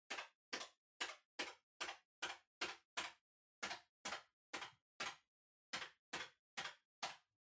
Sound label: Calculator (Far Single Mid)